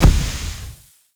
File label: Smoke Explode; Survival Instinct; Warframe; Mods; Pet Mods